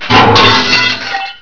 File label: gib; hl1 npc; rgrunt